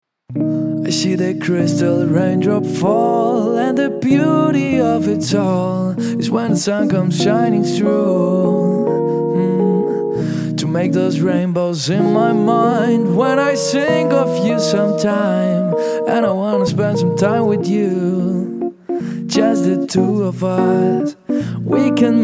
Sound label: R&B SOUL, with piano